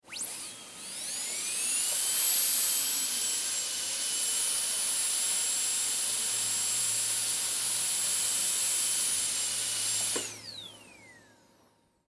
Dentist Drill On Run Off Small Motor; Hospital Sound Effects; Emergency

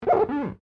GLASS SQUEAK FINGER

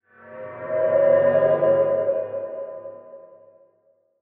Horror Swell Ghostly; Horror Swell Ghostly Swirl; Scary Tones; Blastwave

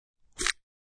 Knf Out Flsh, A knife pulled out of flesh; Stab And Slice Sound Effects; Combat

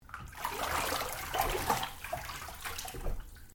Water Bath Spa Movement; Domestic water movement; Water (Sound Effects:Nature)

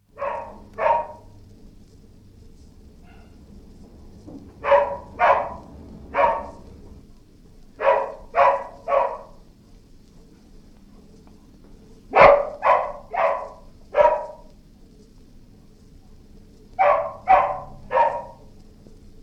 Dog, distant barking; Dogs (Sound Effects:Animals); BBC

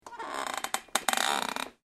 METAL CREAK; Materials

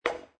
Billiard Pool Snooker Cue Hits Ball; Pool cue, snooker cue, billiards cue

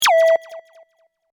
Sonar Ping Space; Sci Fi Sound Effects; Sci-Fi (Sound Effects:Science Fiction); BlastWave

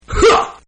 Karate Heyah, Exclamations; Voice

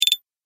Menu Select Triple, ping; multimedia

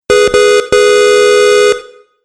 Car Horn Three Honks; vehicle

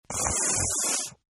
Sniff; Sickness Sounds; Sniffling; Human

